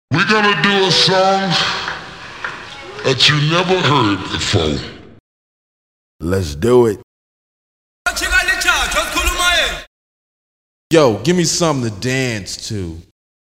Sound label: IN 2 D GROOVE; Polestar Magnetics The X-Static Goldmine